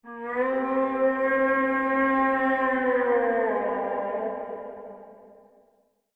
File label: ornithocherius roar; dinosaurs